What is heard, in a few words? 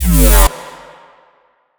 lazer; foxdot default